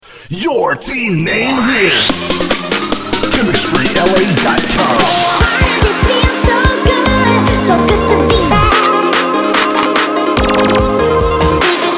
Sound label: Cheer Mix